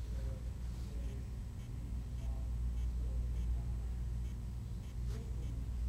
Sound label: sound recording cf recorder sound; sony hvr mrc1